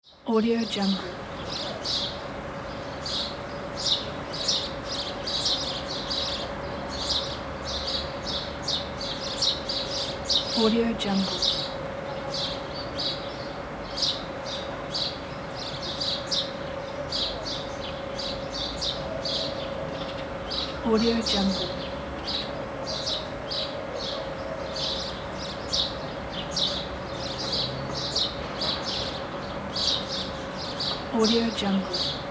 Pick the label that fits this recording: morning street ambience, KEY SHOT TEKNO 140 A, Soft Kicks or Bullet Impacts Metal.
morning street ambience